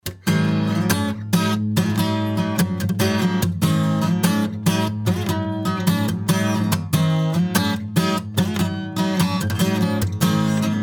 Alvarez Straight

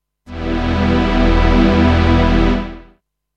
Pad Release Time 500 ms

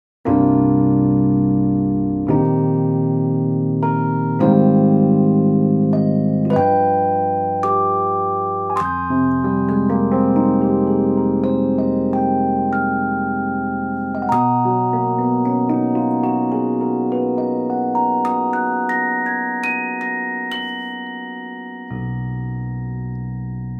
Room

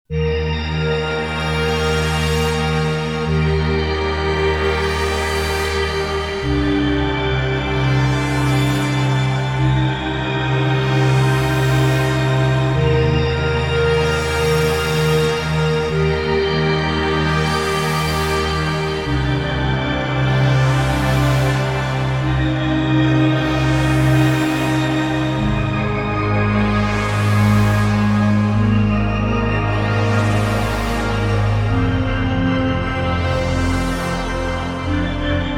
Sequential Prophet X Batt Cave

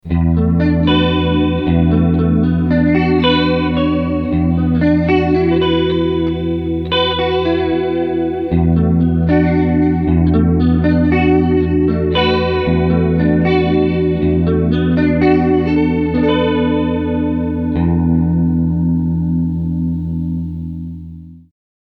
Flying Chorus

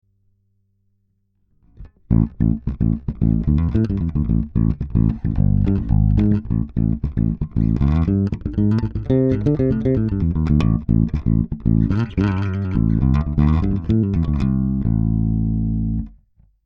goldmike mk2 Bass Limiter

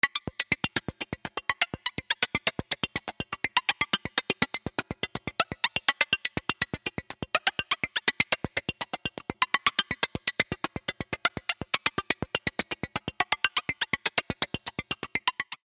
russian filter Perc BP with synced lfo

